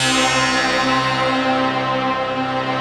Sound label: rough stack